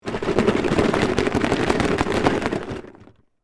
Plastic container or box shaking objects inside; gfxsounds

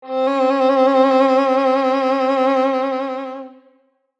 Violin thrill

